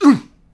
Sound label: Hit Vox; Civ4 warlords